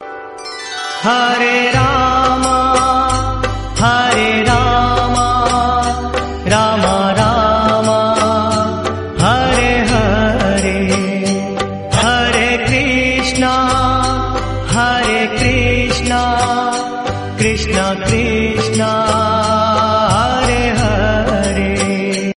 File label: Hare Rama Hare Krishna Classic Mantra; Ringtone